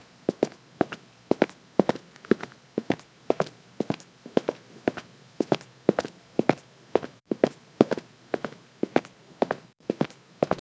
quick footsteps walking; human sounds

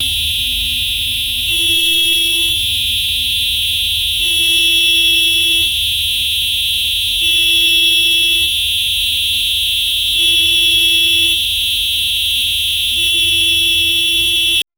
AI humming sensor beeps

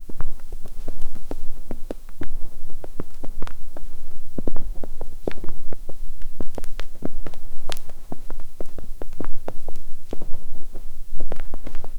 low eerie hum faint foots

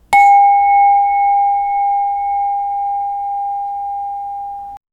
the sound of a tibetan, musical instruments

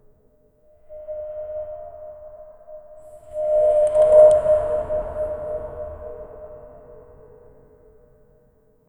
echoing wind blowing thro, vastness

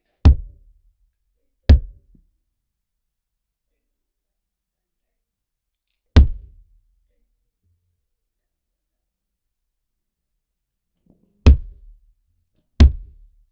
punching kicking, martial arts

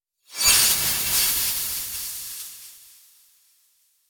a blow to the magical, armor

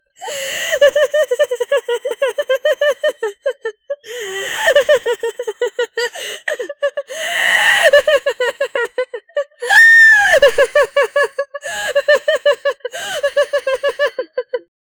a woman is crying in; distress

